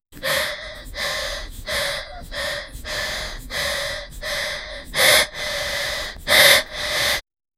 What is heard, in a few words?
a 5 year old kid, breathing